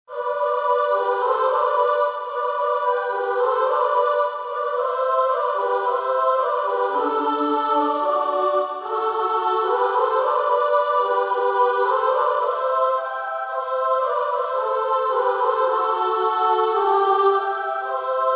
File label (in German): Lerchengesang canon; Sechs Lieder op. 48; Felix Mendelssohn